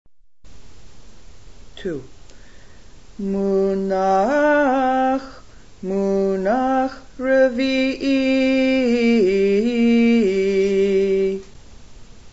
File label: munach munach revie, Haftarah Trope